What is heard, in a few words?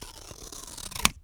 Cutting Cardboard with Scissors; Office Supplies; 1001 Sound Effects